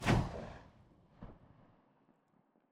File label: nagant rev; weapons